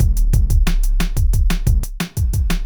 snare break

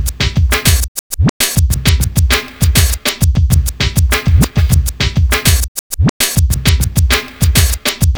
funk baby

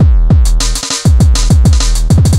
wort 100bpm

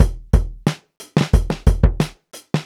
70s funk beat

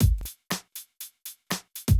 stomp 120

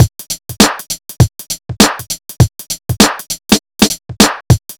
fast hat 100

